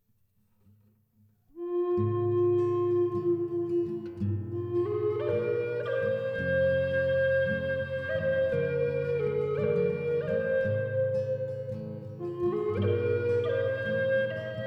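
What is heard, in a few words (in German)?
Eastern Red Cedar 6 Loch Fis tief 432 Hz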